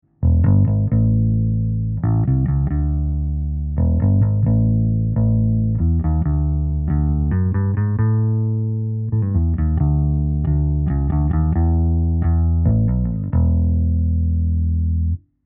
API 512c Bass Amp U47fet

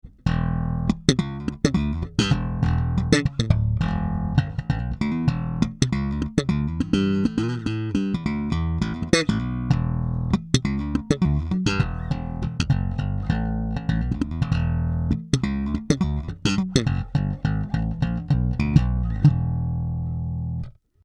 Jbass slap eq; HB Block 800b

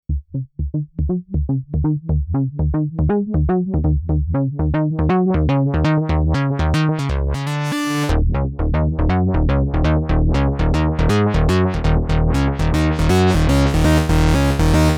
Synth; Elysia skulpter 500; Shape One 30

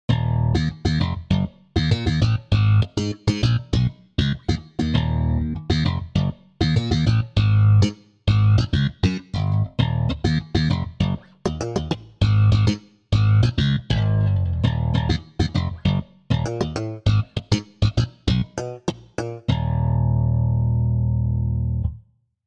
slap

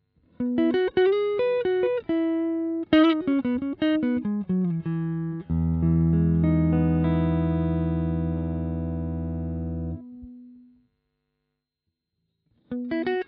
Chapman Melody All positions

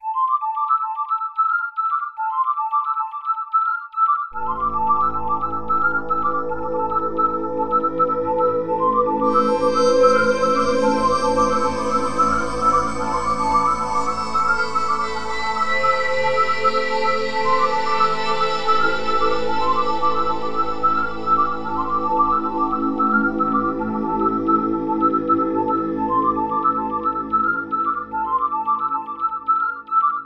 Space Sequenz

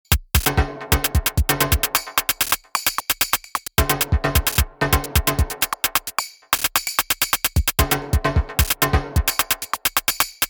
Beat; Five12

